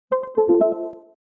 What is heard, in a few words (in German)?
sonifikation earcon usb